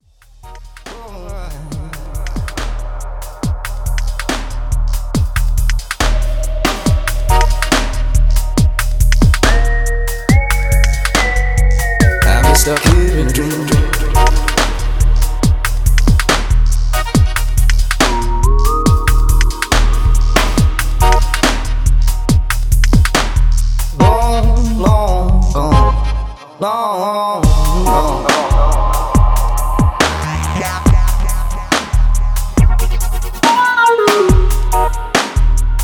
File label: DUBSTEP